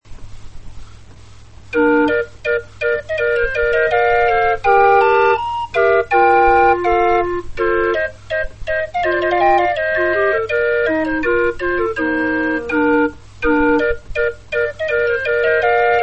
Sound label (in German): floetenuhr